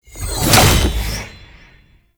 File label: Heavy Orbital Strike Cast Plant; Abilities; Warframe; Tau; Dax Heavy